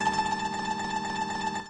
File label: shamisen tremolo; Far East Drones and Plucks